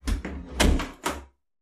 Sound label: door wood close